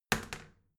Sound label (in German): toilet seat wall open regular, badezimmer